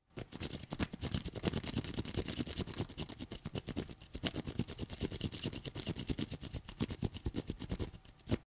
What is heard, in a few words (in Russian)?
zvuk palcev